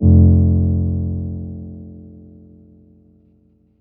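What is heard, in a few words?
organ PedalBass F2; Polyend; Simulacrum Sounds; 1 Shots; Acoustic and Electric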